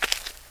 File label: GRASS, FOOTSTEPS; 1000fx